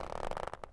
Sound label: rope strain